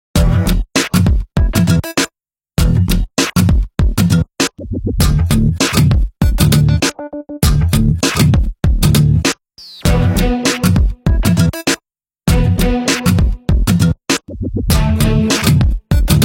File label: break